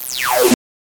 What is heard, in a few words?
Rise